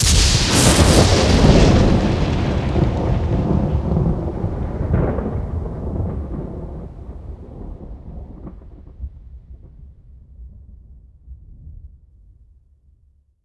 Lightning Thunder 1 shot; Journey

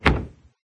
drop default; There; ThereClient; gamekit

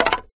cards your turn; uisounds; cardgames; There; ThereClient

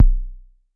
Kick; Ableton Live 12 Lite; Core Library; Drums

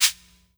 Shaker Sad; Drums

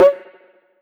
Cuica Low; Drums; Misc Percussion

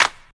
Clap Slackjaw, One Shots Drums; Ableton Live 12 Lite; Core Library

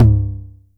Tom 909 Mid Low; Drums

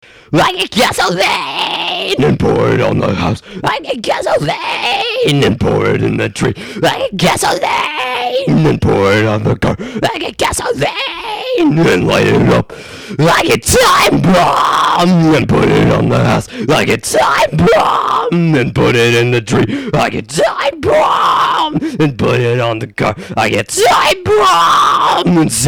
Screamo Song

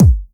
Kick Machine

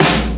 snare; Ableton Live 12 Lite; Max

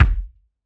Kick Jazz

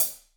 Hihat Half Open

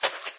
foot walk; There; ThereClient

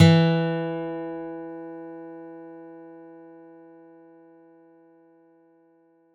Mallet Guitar E2; Multisamples; Ableton Live 12 Lite; Core Library

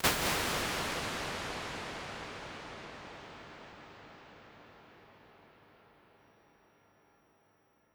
Bigger Spaces Overmuch R, Impulse Responses; Ableton Live 12 Lite

